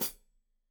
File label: Hat Foot, Hi-Hat, Cymbals Stereo